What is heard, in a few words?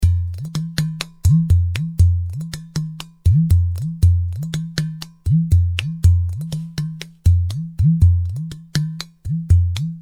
Udu